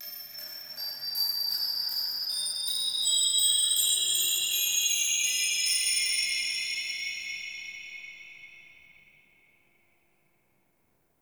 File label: Root Cave Magical Chimes; Ambience; One Offs; Neathuns Root Cave